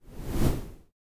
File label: wind up